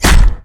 projectile impact; Dota 2; Huskar

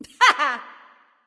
laugh; Beach Nu Wa; Smite

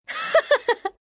Wind laugh

